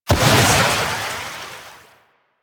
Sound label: Aqua Board Jump Large; Warframe; Yareli